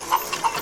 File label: win loop; eggomatic